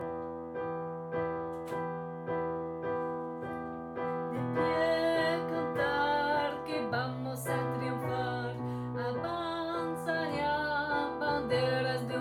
tenors basses; pupitre basses; enregistrements de travail

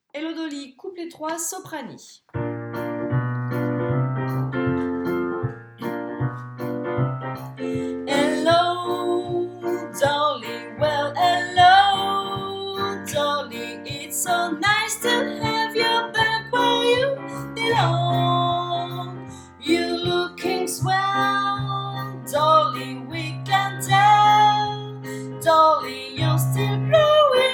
couplet soprani; pupitre soprani; enregistrements de travail

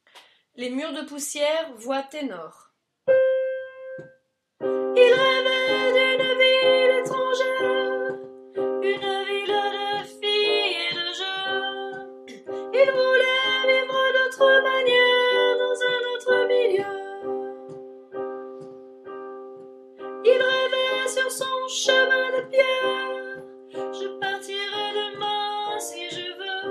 les murs ténors; pupitre tenors; enregistrements de travail

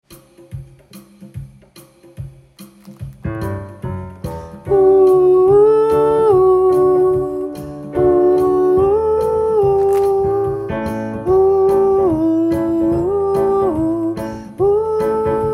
simple soprani; pupitre soprani; enregistrements de travail

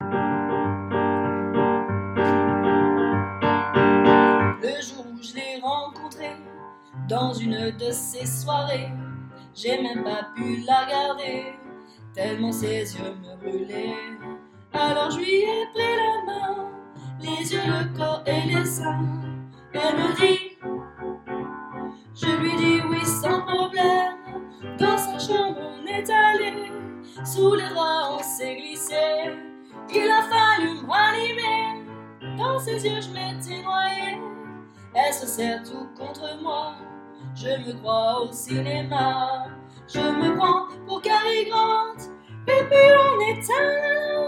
Ténor partie; pupitre tenors; enregistrements de travail

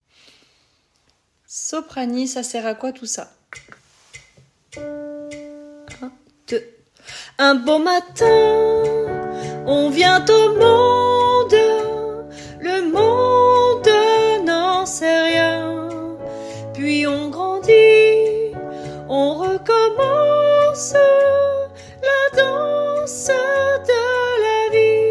soprani couplet

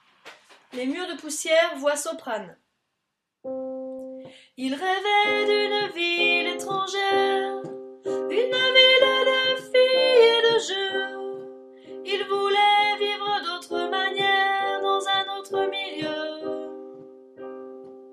Soprani, enregistrements de travail; pupitre soprani; les murs

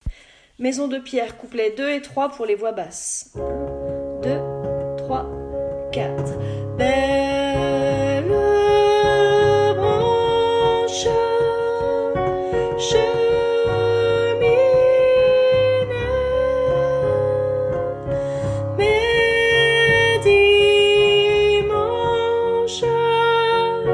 Paroles Basse; pupitre basses; enregistrements de travail

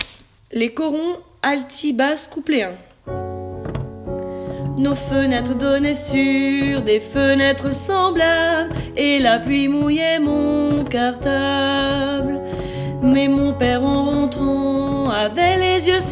Alti Basse

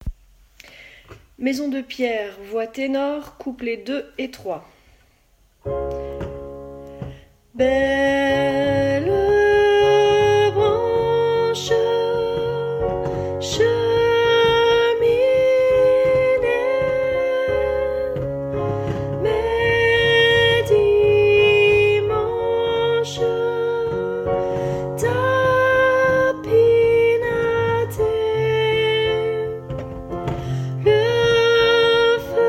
Paroles Ténor; pupitre tenors; enregistrements de travail

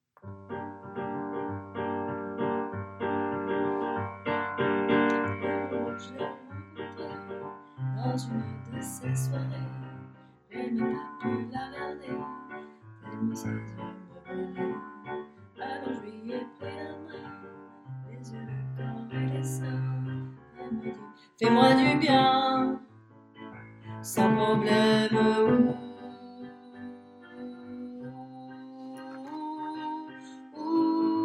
Suzette Alti partie; pupitre alti; enregistrements de travail

